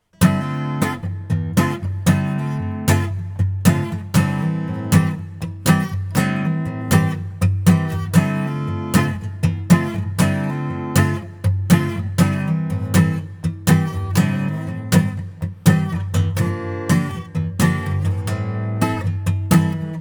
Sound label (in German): Akustikgitarre Preset Musik Hall Kleiner Saal; Tascam Portacapture X6